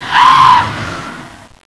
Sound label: die, horror; slender